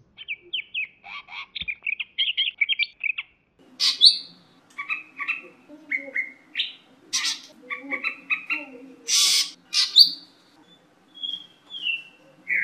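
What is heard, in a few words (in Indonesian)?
suara cucak hijau medan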